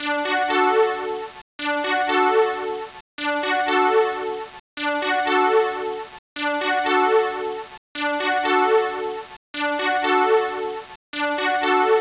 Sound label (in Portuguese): sint Loop